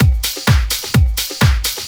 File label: Latin Loop 128 BPM